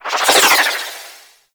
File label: Arch Wing Prime Dodge Roll Left; Movement; Warframe; Vehicles